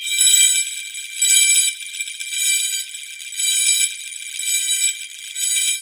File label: JAP COWBEL; World Percussion